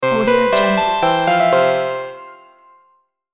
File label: magic melody